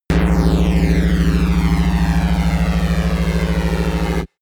sci fi alarm red flash long

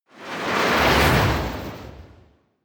military jet fighter flyby whoosh

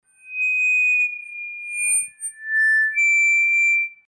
hi freq speaker feedback

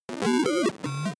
broken computer random fraction

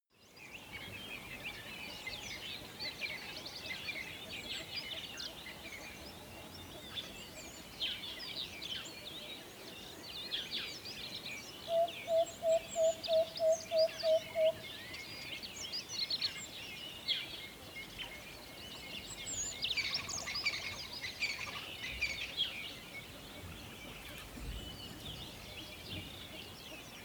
water hole early morning

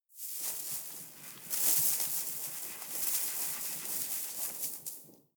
snow trickle medium

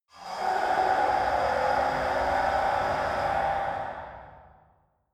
mechanic noise steam

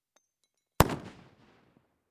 flashbang explosion

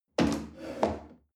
chair wood place slide medium

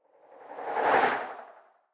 whoosh simple flutter phase

